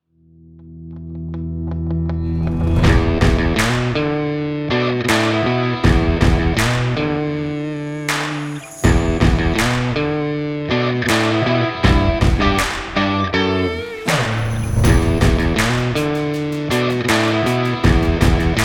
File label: stylish drive energy sport rock; 2025 annual angels game